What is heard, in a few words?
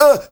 MALE SOLO, MALE NOISE, Heart of Africa